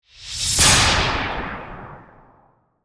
Magic Spell Whoosh, Horror, Magic, Spell, Whoosh, To, Hit